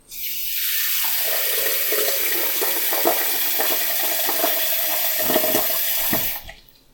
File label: into water boiler; cooking